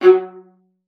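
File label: F#5, viola spiccato; Xsample VOL-5; 90 s Sample CDs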